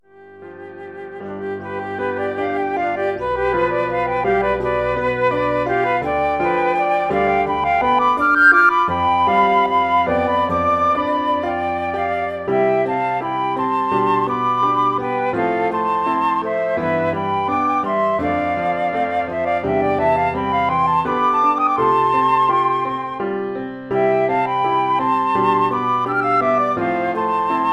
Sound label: flute duet + PA